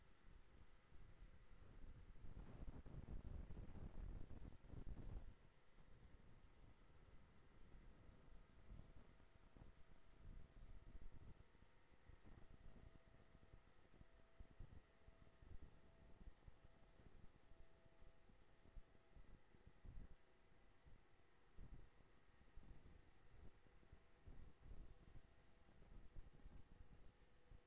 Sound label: HYDROPHONE 16000Hz; JASCO AMAR HYDROPHONE 740